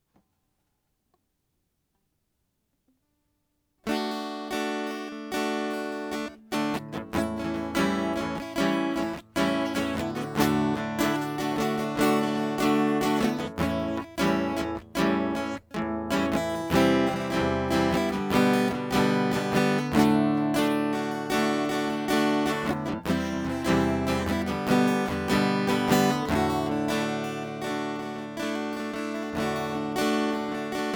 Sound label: Route Out Guitar; hyper terrestrial